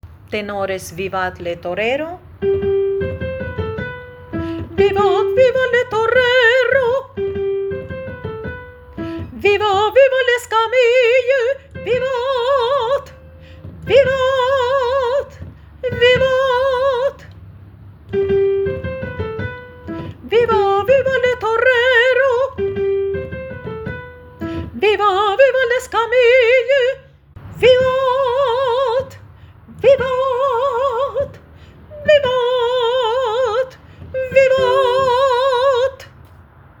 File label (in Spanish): tenores vivat